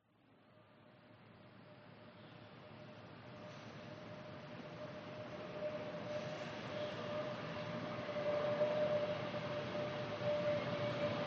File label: vehicles airplanes military fokker 50 propeller aircraft fly past slight dist